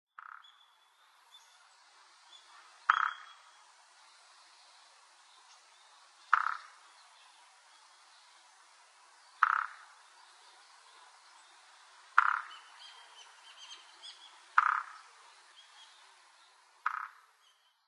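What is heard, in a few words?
Japanese Pygmy Woodpecker, Kunitachi; koe; kogera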